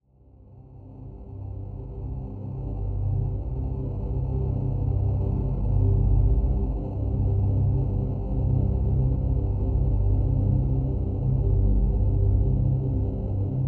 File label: Bass Dark Nimbus Bass C; living air